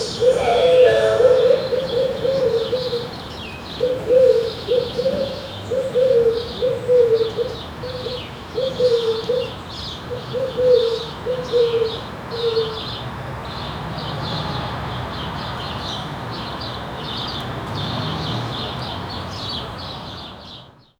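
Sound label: ambience exterior village; amb extrieur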